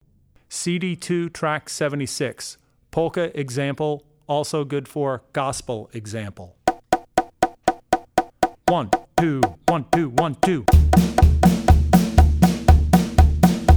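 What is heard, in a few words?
Polka - Polka, Drum Tracks; Drummer's Bible Tracks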